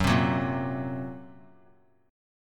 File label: F sharp Major Flat 5th F sharp; F#Mb5 strummed